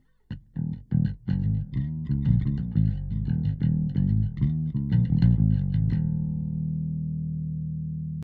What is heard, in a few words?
bass; SplitEQ